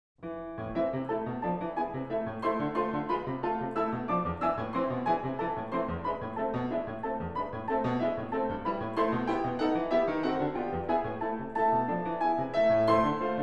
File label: Etudes in A minor, Piano; Chopin